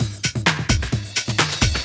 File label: Dance Grooves